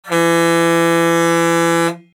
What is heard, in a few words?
Horn 95cm